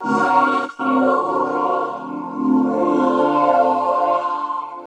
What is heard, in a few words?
voc, vocal-fx, vocal house; PS-28 Vocal House Samples